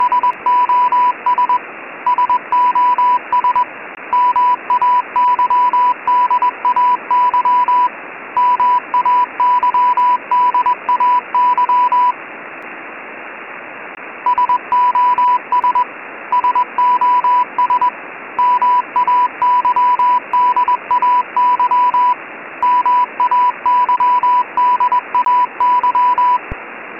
SOS Mayday Morse Code 988 Hz 20wpm With Background Static